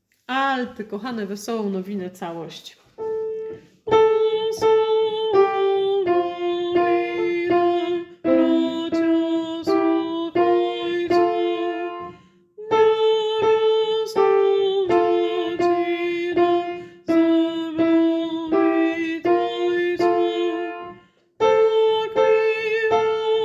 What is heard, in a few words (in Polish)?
Wesola nowine bracia sluchajcie Alt calosc; Boze Narodzenie